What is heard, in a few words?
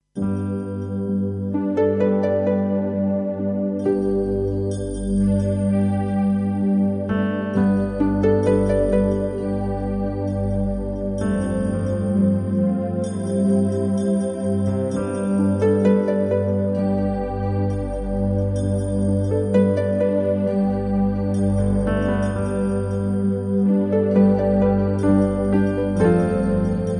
Soaring and Sweeping